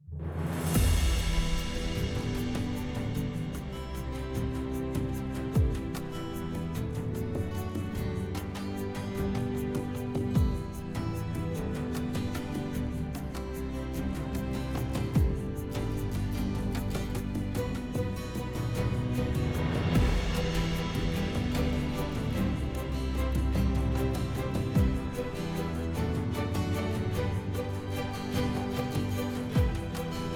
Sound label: Music Only